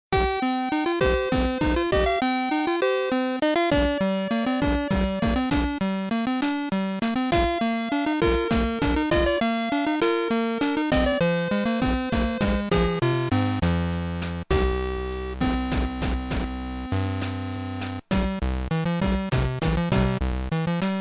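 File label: 8 bit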